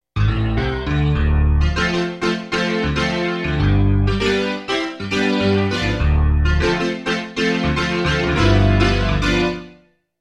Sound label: CP-70; Rich CP-70